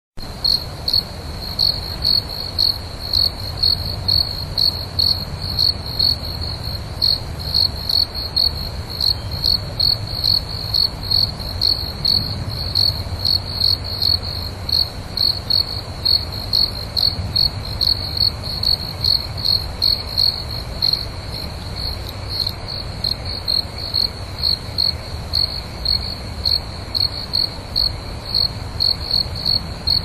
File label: crickets, midi